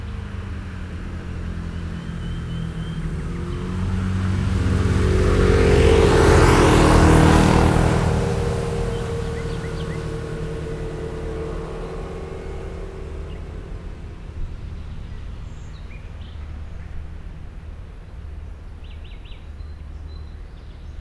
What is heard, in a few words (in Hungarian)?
hegynek fel, kanyar; szallastol keletre; thassos 2016